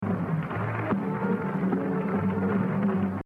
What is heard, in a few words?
Exercise 6, Jazz Drumming - 60%; Gene Krupa; The Evolution of Jazz Drumming (Gottlieb)